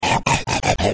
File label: Eating Voice; Werebeaver